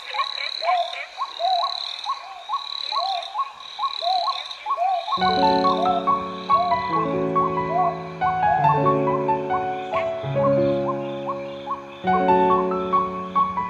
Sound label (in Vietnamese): nhac nen yeu du lich; tiktok